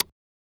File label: Beatbox; neuro; Sinistarr